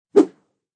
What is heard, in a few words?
Sword swings in air; Combat Swings